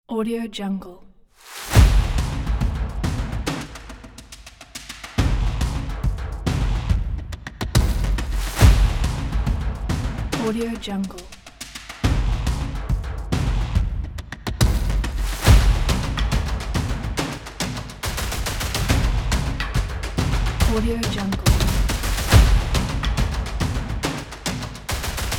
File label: industrial drums